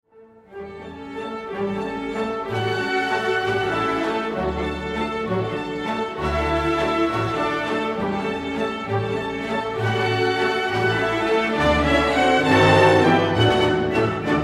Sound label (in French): la valse; strauss; la valse de l empereur